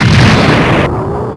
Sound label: explode; evolution; Frieza